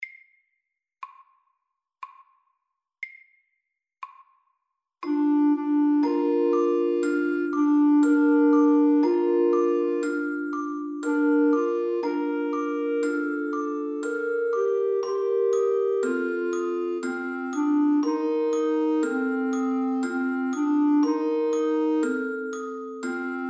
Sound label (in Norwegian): Vuggesang SAKTE